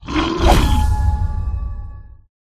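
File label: lizard shaman attack bottom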